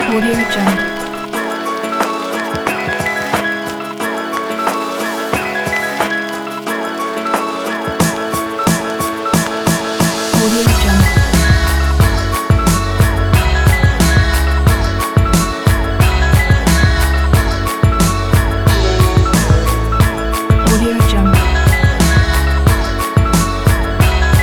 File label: hiphop vlog background